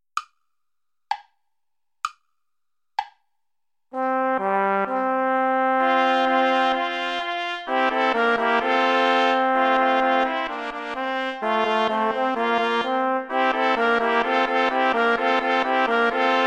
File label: play along without trombone; praetorius michael psallite unigenito